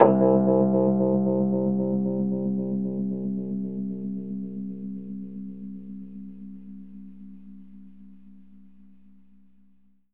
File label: Rhodes fast C, 3D Keys; 3D Sound; 3D Keys VOL-1